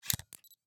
Camera, Pentax KS2, DSLR, electronic, mechanical, ready shot, flash up, focus, quick; Eiravaein Works; Vaeyan IV